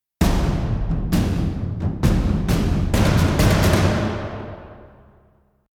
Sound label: Combat In; Skellige